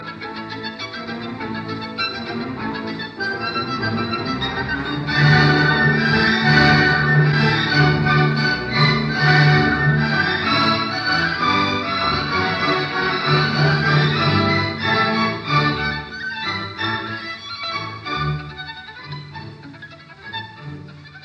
Violin Concerto in D major Allegro giocoso ma non troppo vivace Poco; Brahms; Op 77